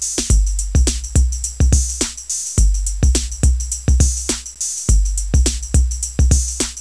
DRUM

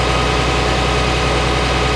Motor Engine; Working Model Program

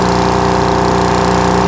Generator; Working Model Program

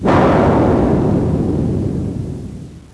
Bang; Working Model Program

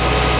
CRANE; Working Model Program

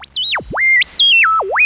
Computer Data; Working Model Program

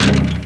Backboard Shot; Working Model Program

